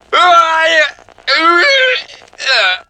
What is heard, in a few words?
death